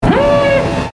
Russian Alarm

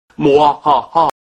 Muahaha

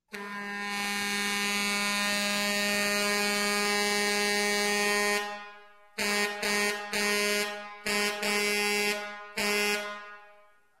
Fire Truck air Horn

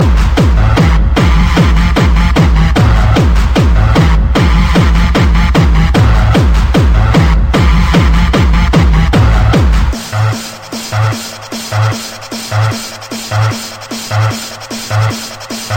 Hardstyle

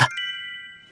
message tone

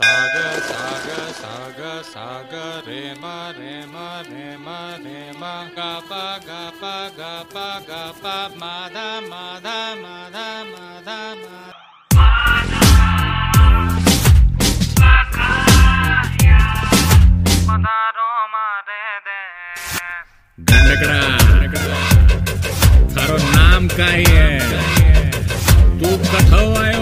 Trippling ringtone

